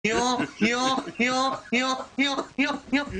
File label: hyo hyo hyo laugh